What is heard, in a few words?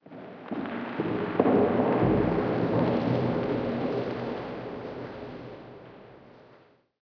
cave, ambient; minecraft; Votive World